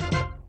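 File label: fanfare end of ball, pinball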